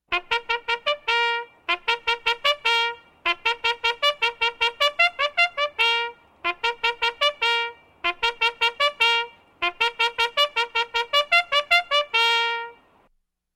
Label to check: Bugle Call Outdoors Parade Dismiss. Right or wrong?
Right